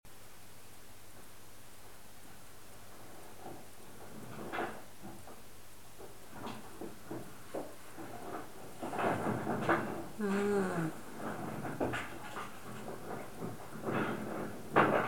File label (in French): chambre bruits minuit